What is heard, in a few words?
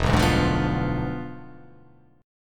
E 7th Flat 9th, down